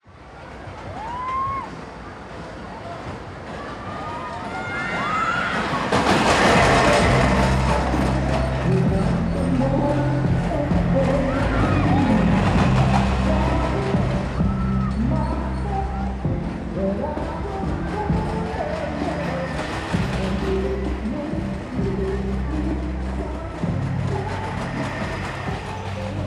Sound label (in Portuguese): Montanha Russa e Musica ao fundo no Parque; ambiencia urbana; Parque Nicolandia